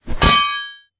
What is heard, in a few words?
SWORD Swing Hit Solid Metal Deep Mono